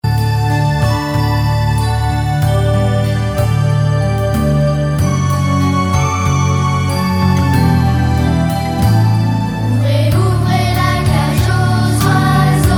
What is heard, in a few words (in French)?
la cage aux oiseaux, chorale; chorale du bois darcy